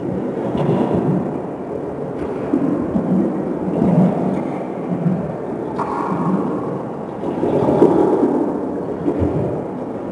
chem liquid, ambients

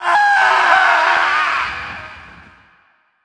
human fall

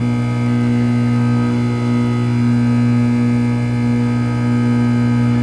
neon

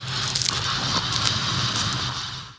idle, alien

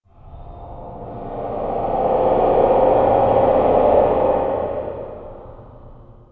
eerie

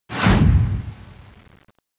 fast air woosh (8 bit)

